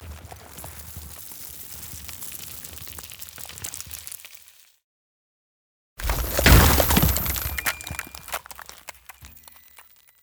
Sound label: Ice Spell Freezing Slowing Frozen Trap; Arctic Aura